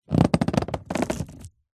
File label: foley luggage leather hard case press down pressure creak